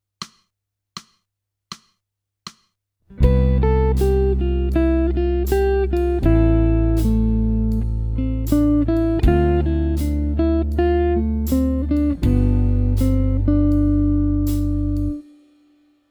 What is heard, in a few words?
jazz